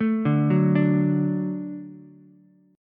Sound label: F sharp Minor Sharp 5th, Ukulele; arpeggiated; JGuitar